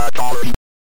Atari

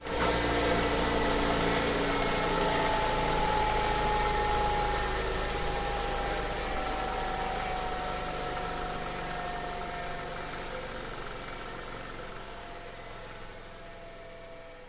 Splat And Squirt

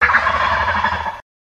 Turkey Gobble

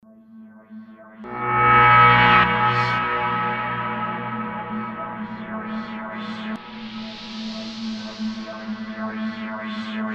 Cinematic Sound; Colin Enger

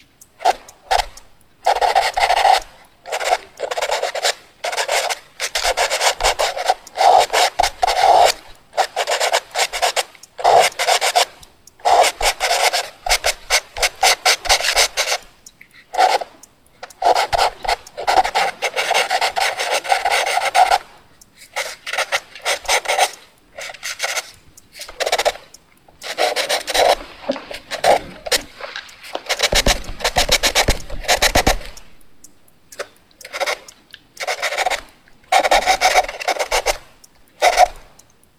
fast drawing; daniel simion

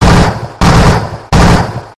Machine Gun Burst 50 Cal

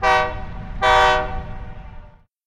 Train Honk Horn 2x; Mike Koenig

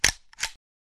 50 Cal Machine Gun Load

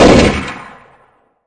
Gun loud; Soundmaster

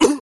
Gut Punch Hook

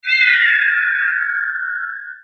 Pterodactyl Scream

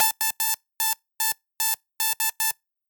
sos morse code; daniel simion

